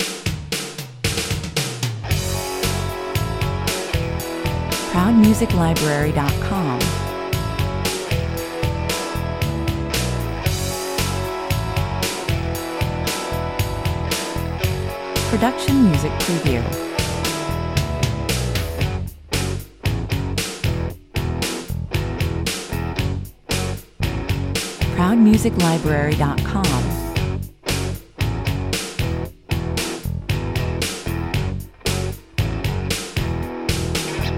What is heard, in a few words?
An upbeat, guitar driven, 90’s commercial pop rock track with a confident and determ, 115 bpm; An upbeat, guitar driven, 90’s commercial pop rock track with a confident and determined rocking groove. Aggressive, strong and energetic